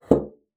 BASSOON; Xsample VOL-6

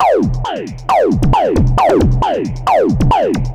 Lazer Face 135BPM, sci-fi Beats

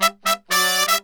MERENGUE

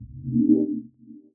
Chords Tones; Equipped Music; Loungin House

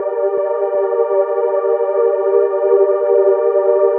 Synth Chords; Eclectic Electronica

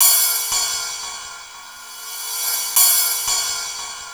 LOOP, 174 BPM, Drum & Bass; Ueberschall; Drum & Bass Flash Trackz

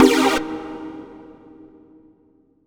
Stab C3, Club Techno; Zero-G; Stabs

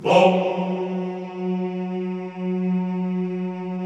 CHO F#2, Vohmm Hohmm; Sound & Vision; Gigapack I CD 2

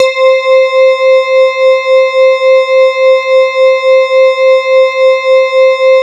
E-HARP, DX HARP; Wizoo - Powered DX

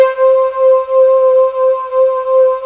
HORNS C5

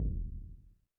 percussion, Roland TD-20

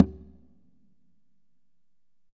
Congas Wet; Drums Percussion

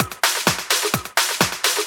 Discohouse Loop 128 BPM

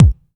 HOUSE DRUM BD

